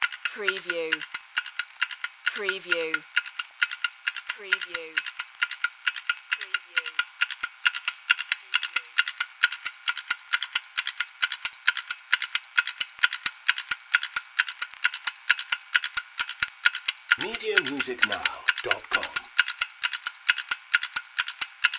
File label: Clock Ticking Vintage